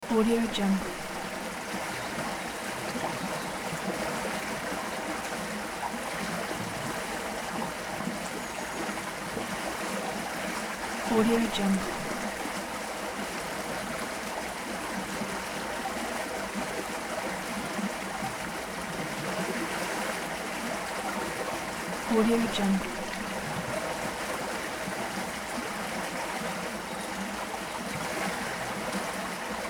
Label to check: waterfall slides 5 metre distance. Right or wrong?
Right